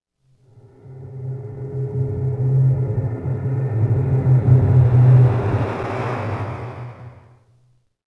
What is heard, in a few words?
City Flyby Med; Ambient; Sid Meier's Civilization 4 Complete; Beyond the Sword